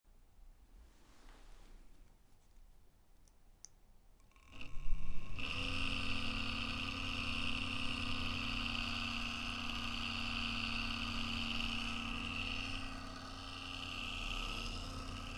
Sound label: Fan Noise